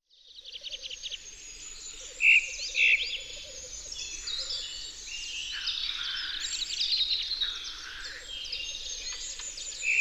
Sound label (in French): Merle a plastron, Concerts naturels de l'Isere; Studio Les Trois Becs